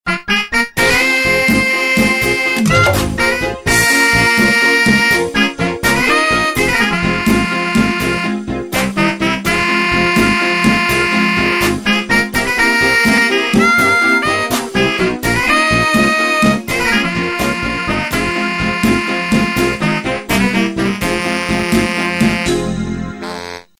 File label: gameover; GarfieldPC